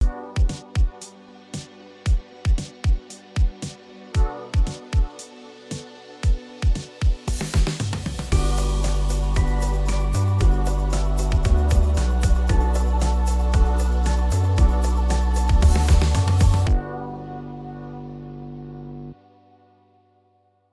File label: romantic, AI music